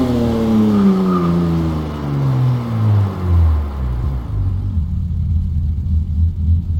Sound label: slowdown, vehicles cars; lwcars; tvr sagaris